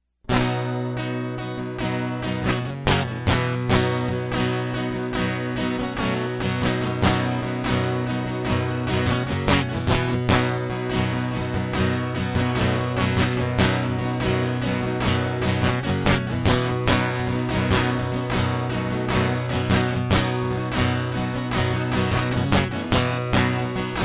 Guitar Loop

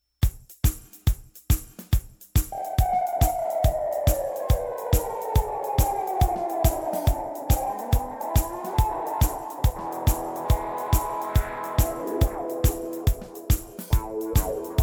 Rain Reign Part III Electric Guitar; hyper terrestrial

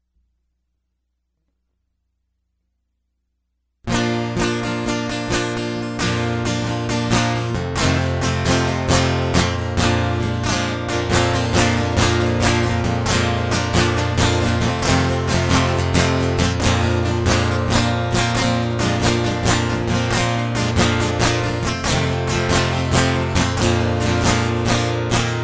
Guitar Prelude

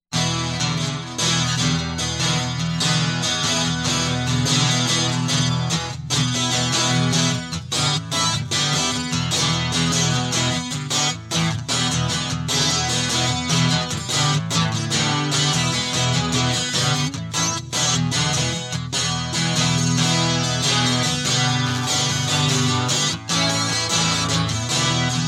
Feedback Loop Guitar Loop

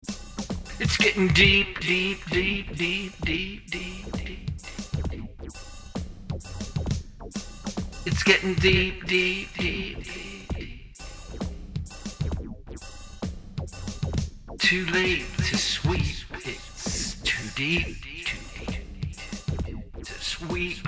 Soundcheck

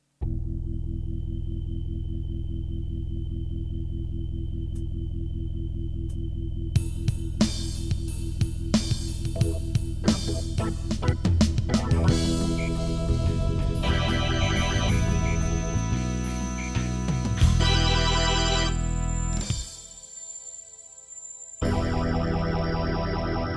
Prelude

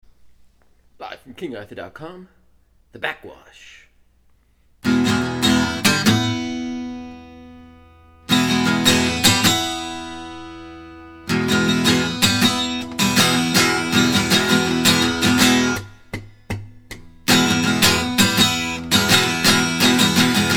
Instrumental; Prolog

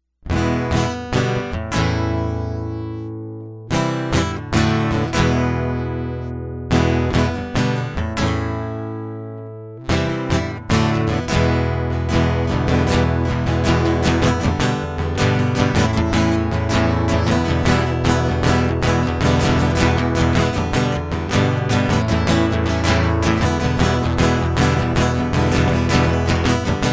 Guitar Loop

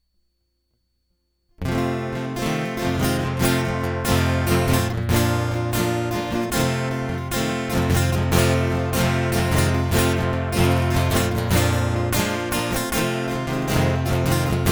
Guitar Loop

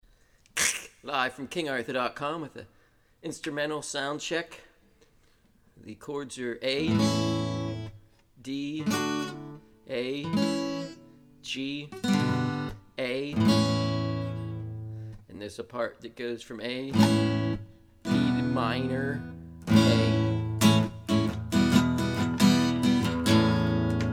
A D G Bm Instrumental

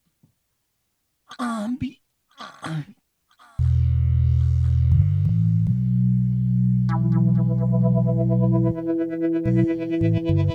Ambient Ambience

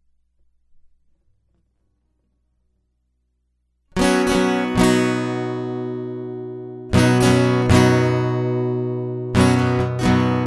Guitar Loop

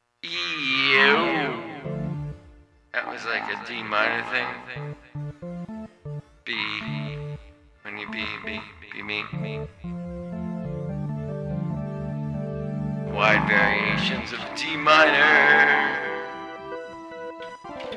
Soundcheck

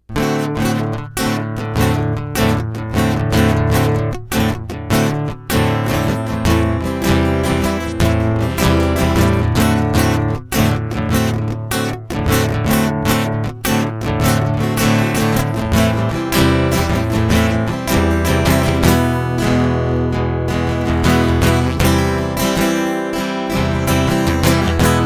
Instrumental; hyper terrestrial

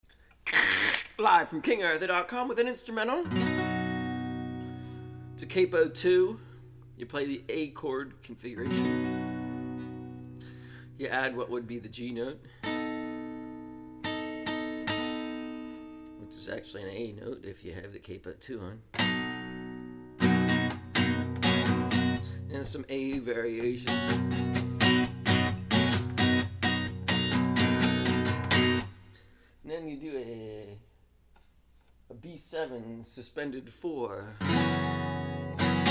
Capo 2 A Instrumental Soundcheck

